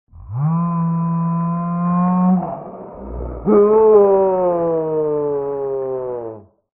yeehaw

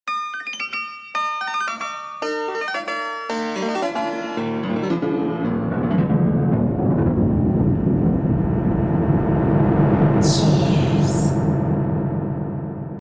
cheers

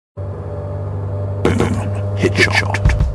headshot; cave

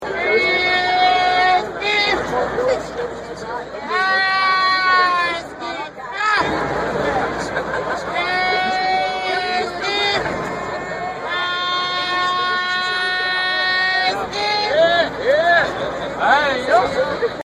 pizz, reverse

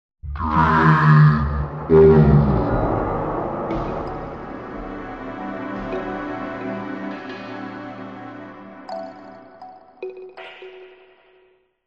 game over

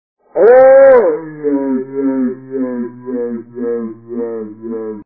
toad; vapesquared

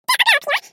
clock

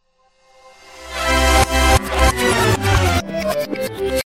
victory music; reverse

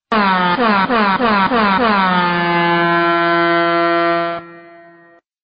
airhorn

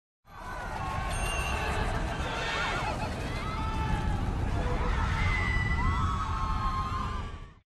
panic; cave

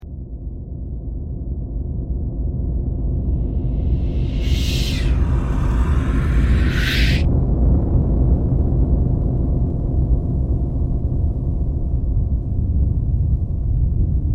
cheers; reverse

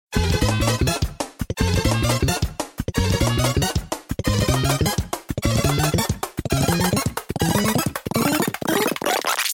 course clear

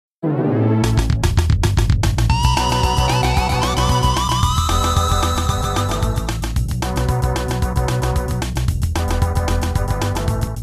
stage clear; dixie

